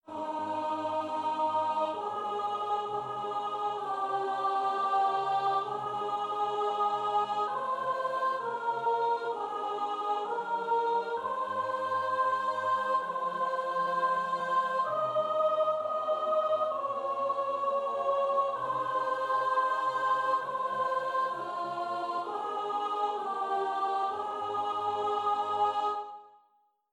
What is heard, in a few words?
Psalm soprano